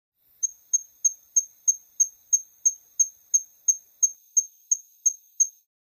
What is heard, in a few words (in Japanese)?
kanetataki